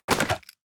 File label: chemical